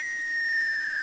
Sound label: bomb fal; hcombat